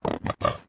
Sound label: Angel Oink; Aporkalypse Pigs of Doom